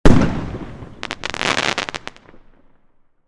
Fireworks single explosion burst and crackle

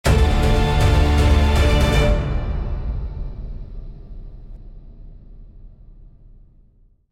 Epic cinematic build swell riser

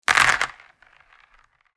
Board game map token throw; leisure

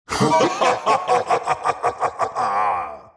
Demon king lord maniacal laugh

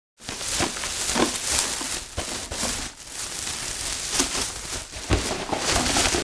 Garbage bag rustling handling

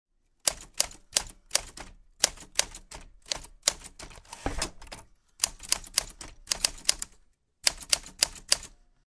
Smith Corona 1940 vintage typewriter typing

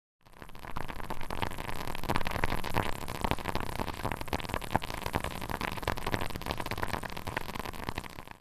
Witch preparing potion magic cauldron

